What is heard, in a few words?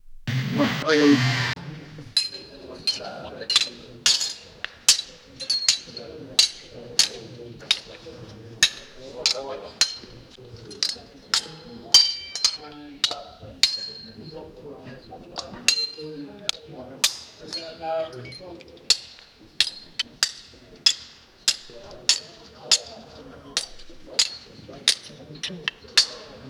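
medieval guild hall ambience; swords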